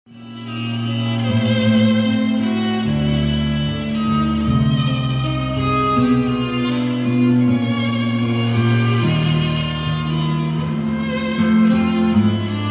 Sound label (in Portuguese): SOPRANO TENOR E ORQUESTRA